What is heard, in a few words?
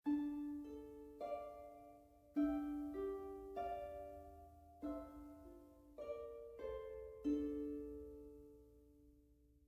harp; gamerip